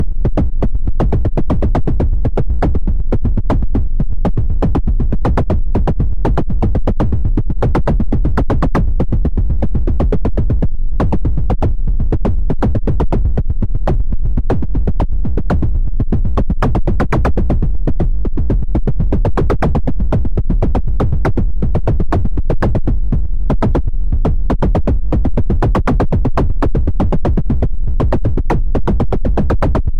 drum bpm

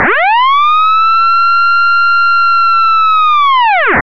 through zero guitar

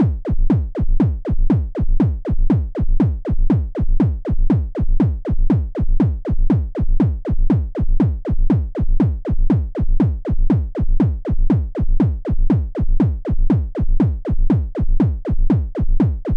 drum pair

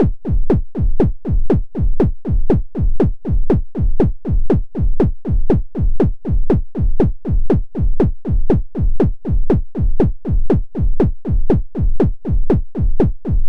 drum duck

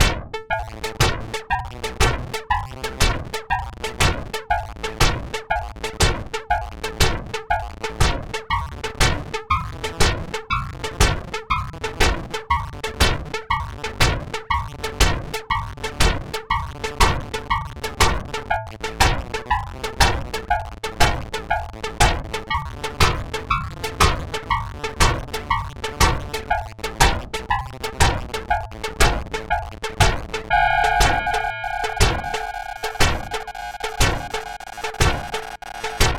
electro gritty

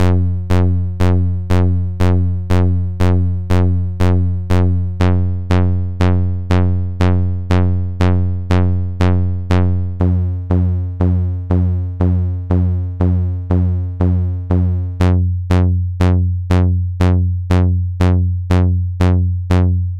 bass sounds; Example scripts for the SAU language, and audio files rendered from them using the saugns

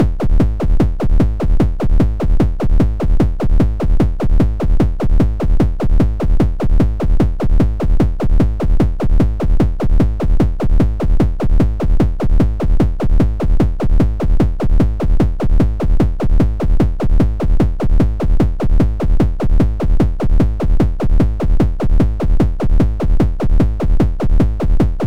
drum lfo

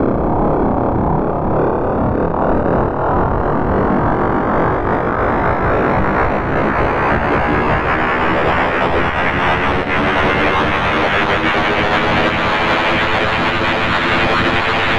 metal babble

